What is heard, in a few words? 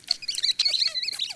rats, dungeon; soundscapes